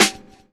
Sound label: SNARE; MLOP DRUM; E-Lab; X Static Goldmine 2; akai